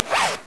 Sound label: zipper; Leisure Suit Larry 6 - Shape Up or Slip Out; Floppy DOS; scummvm